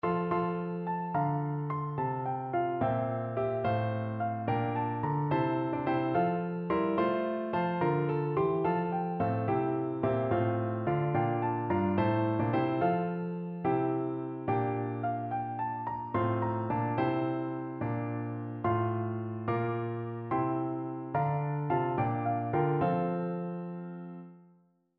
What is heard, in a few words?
sussex, piano duet